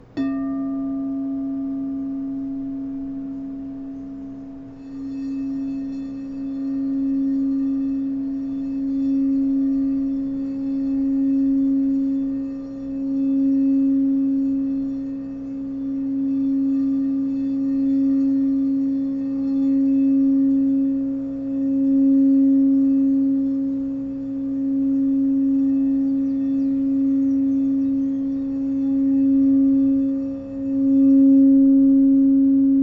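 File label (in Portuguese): FOSCA Cs4, quartzo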